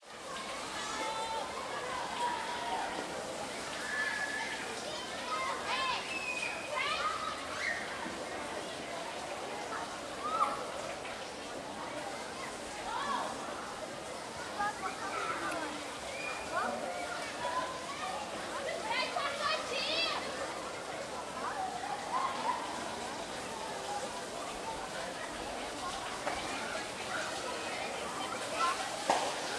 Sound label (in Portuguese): Multidao em Piscina Natural de Brasilia Agua Mineral Parque Nacional